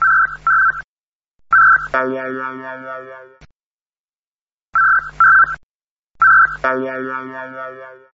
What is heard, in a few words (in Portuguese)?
Tom SMS Perfeito